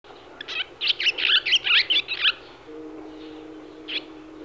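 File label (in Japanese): ツバメ, 野鳥の鳴き声; 筑紫小野鳥観察ｸﾗﾌﾞ支援; chikushishou bird watching club 2018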